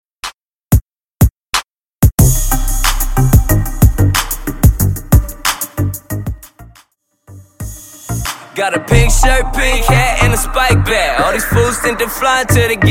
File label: Redrum